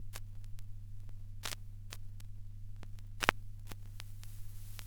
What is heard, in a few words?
crackles, Vinyl; Misc Percussion